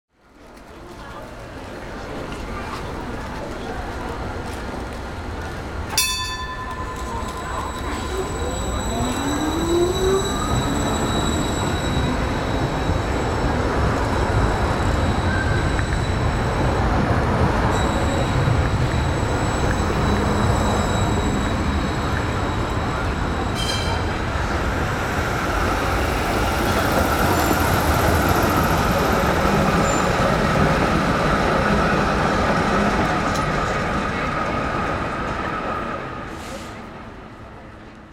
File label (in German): Tram Bimmeln